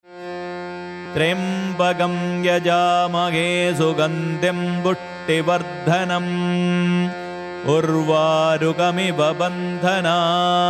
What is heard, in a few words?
Mṛtyuṃjaya Mahā Mantra tryambakam